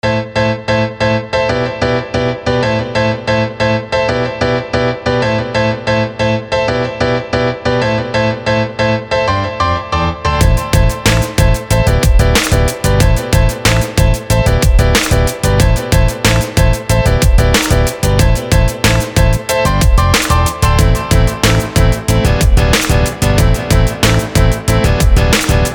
Motivational rap beat hip hop instrumental background music; royalty free; Mantra Studio